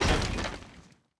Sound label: DROP Weapons